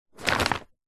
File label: leisure game mat plastic sheet folded pick up